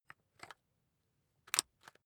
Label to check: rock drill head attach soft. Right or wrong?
Right